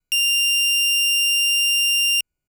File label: Ramp F6, keys; fleet keys Samples